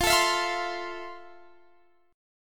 F Major 7th F, Guitar, Standard; strummed; JGuitar

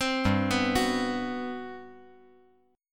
G Major 11th

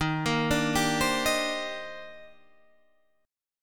D sharp Major 13th, down, Guitar, Standard; arpeggiated; JGuitar

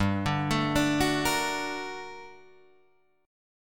G Minor Major 9th, down, Guitar, Open D

